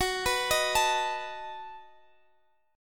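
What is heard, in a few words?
B 7th G flat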